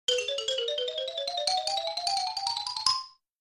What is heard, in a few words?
Xylophone Up